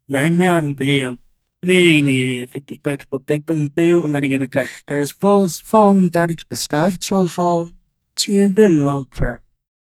priest gather funeral bur, gospel

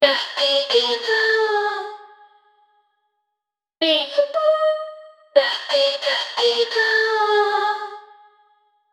female dubstep vocal chop; SFX Engine

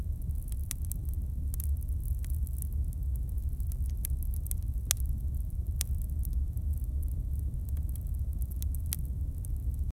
cozy indoor winter cabin

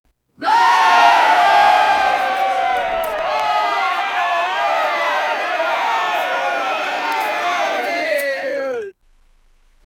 gavel bang crowd yelling

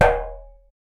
metal barrel hit deep met; deep impact

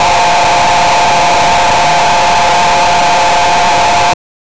loud glitch screamer with; broken

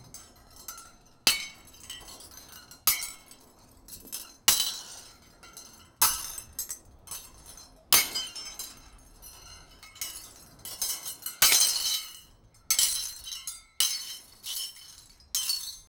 noisy clanging of the, metallic

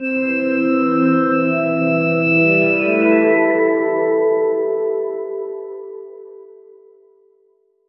warm but serious atmosphe, logo

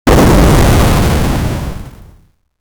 videogame fire burst sound

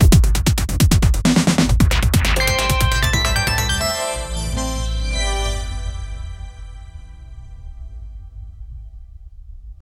bouncy intro jingle

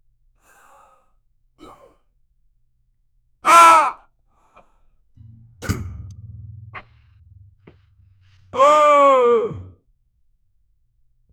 the sound of a man, human reactions

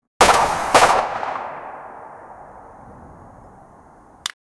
an extremely high quality, cannon

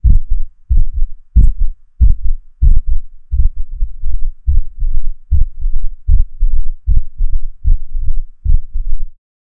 heart pumping

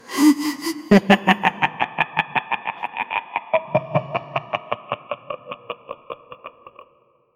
creepy sinister laughs ca, cave

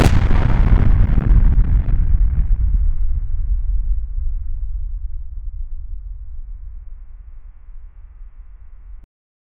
earth shaking blast deep; destruction

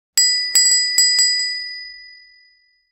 very soft but loud ring, bell

